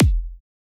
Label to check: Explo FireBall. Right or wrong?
Wrong